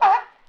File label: dog bark; Animals